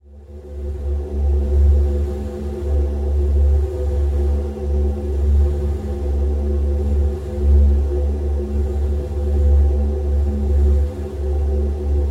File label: grains didjeridoo